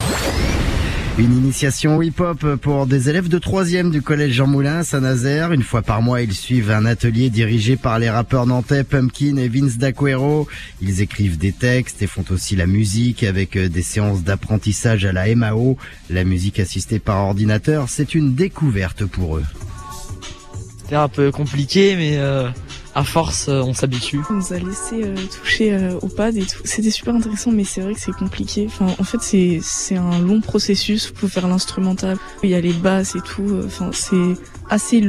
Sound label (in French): atelier hiphop; Jean Moulin